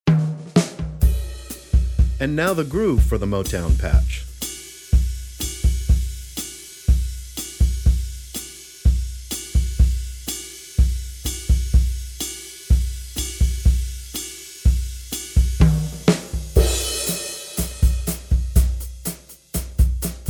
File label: Motown Groove